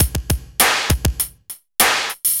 BPM100 SYN; AKAI S-Series CD-ROM Sound Library VOL-4

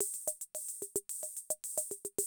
CR78, DrumLoops

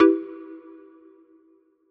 Em, Chords; Electronisounds

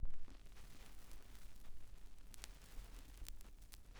SCRCH, Others Loop BPM100

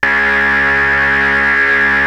OSCAR C#2, synthesizer; Hollywood Edge - Giorgio Moroder Rare Synthesizer Collection

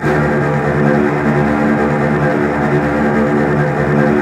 STR TREMOLO, Strings

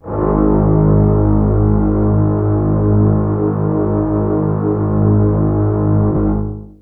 g#1, Cellos, MIRAGE; Retro Sampler

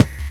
BD; Best Service; Dance Mega Drums

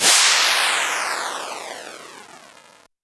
fx reverse; Ueberschall; Techno Trance Essentials

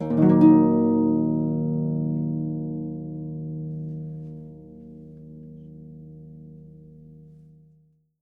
D#, Percussion & Harp; MIC #1 -R; Miroslav Vitous

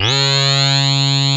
TALK LEAD C3, KORG, Analog; USB Soundscan vol.49; Analog Fantasies; AKAI

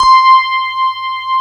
FM ELP C5 R, Keyboards Old School; USB Soundscan vol.09; AKAI; FM ELP 2

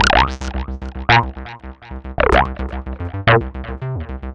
UR 303, 303 loops; Sonic Foundry (Sony Creative Software); Ugly Remnants VOL-2

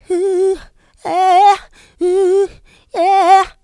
Vocals, Adlibs; Houseworx

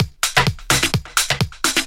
Clubhouse Loop 128 BPM

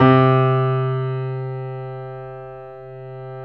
PIA GRS, PIA_GRAND, Roland

